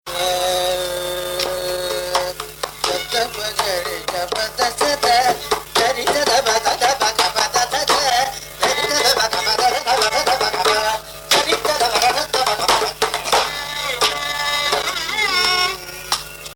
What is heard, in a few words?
mohanam swaras mix gati